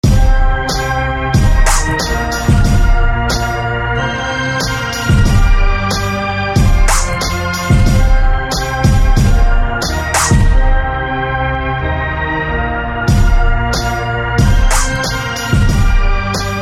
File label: Flare Flute